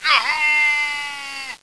Yahooo; KKND

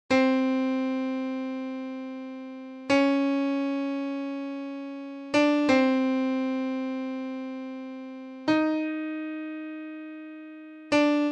BGM

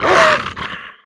zombie dog death

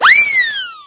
spring trap; DKR64

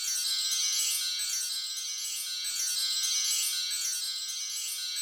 Gold Effect

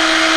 caddy rev; GTA IV

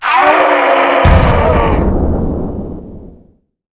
cyclops die; Daikatana

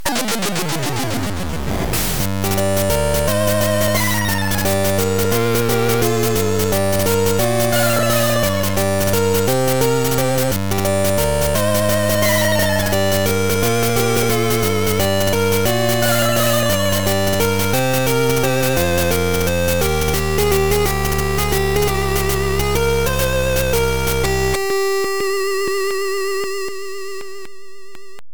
gameover; Animorphs

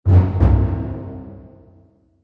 Harry Dies; HPSSWin

